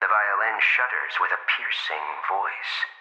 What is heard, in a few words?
Violin